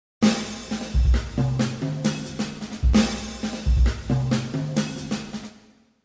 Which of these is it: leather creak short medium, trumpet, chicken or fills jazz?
fills jazz